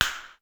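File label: firecracker; sr16 perc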